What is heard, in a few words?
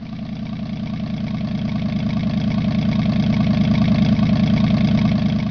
ENGINE